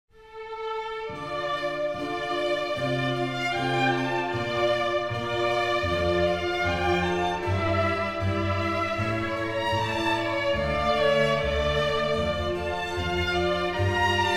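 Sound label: Flute Concerto In D major; Galuppi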